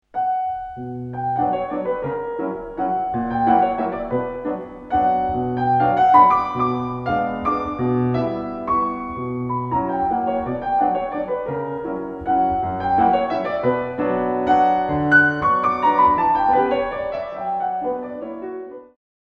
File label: piano